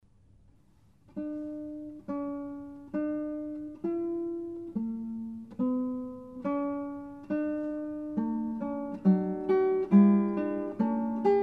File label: BWV998 Fuge fade out